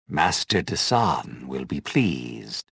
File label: victory; reborn